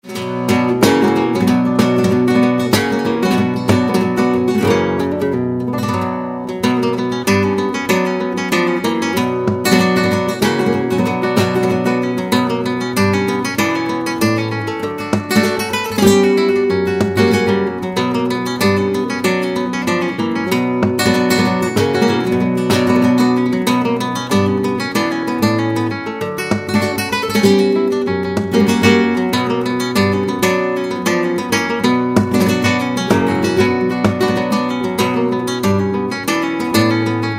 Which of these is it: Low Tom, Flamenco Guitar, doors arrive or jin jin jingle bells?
Flamenco Guitar